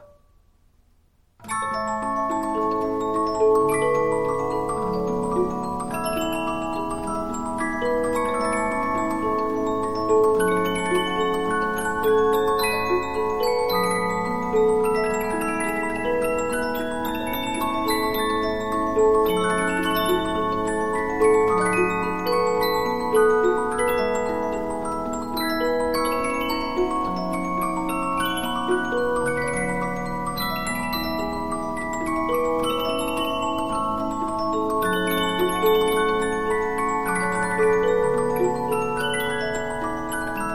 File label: Polyphon, 15inch disc musicbox